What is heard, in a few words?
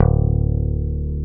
D1, BASSES, Roland